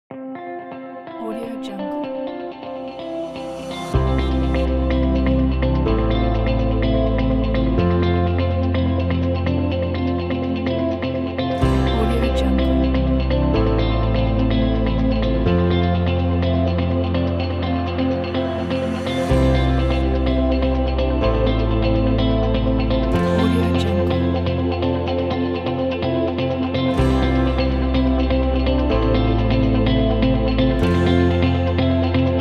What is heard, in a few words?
the inspiring upbeat corporate